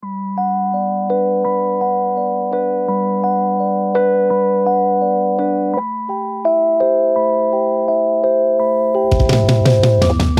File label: blues